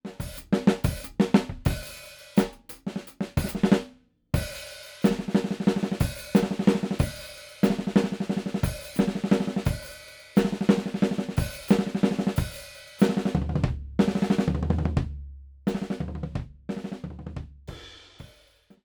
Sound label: BLUMLINE FRONT OF KIT